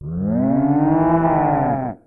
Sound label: (big) roar; baby bowser; smw2